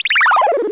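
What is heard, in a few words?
letter explode; helicop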